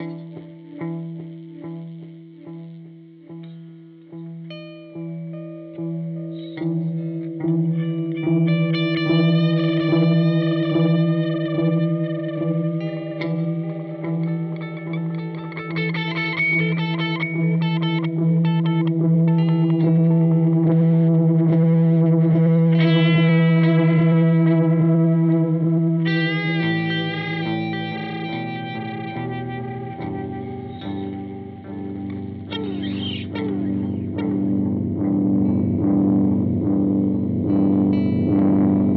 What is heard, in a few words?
Oscillation Experiment; Boss DM-2w